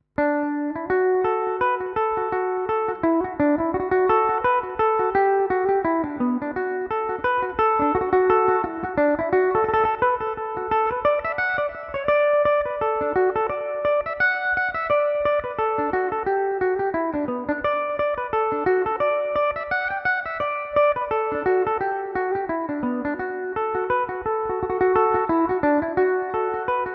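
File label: D major belmuse, irish mandolin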